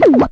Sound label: underwater blurp; Weird and Interesting Hits; single hits